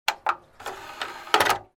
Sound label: Technology Electronic DVD Player Close